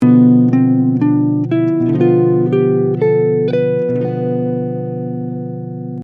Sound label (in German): Lokrisch; B Locrian with B Diminished Chord